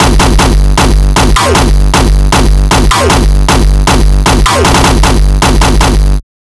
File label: kicks loop 155; GhostHack